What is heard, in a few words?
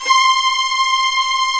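violins; Roland - String Master Series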